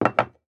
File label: Household Bowl Put Down